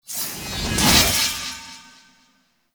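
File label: Long Blade Projectile Rune Cast; Powers; Runes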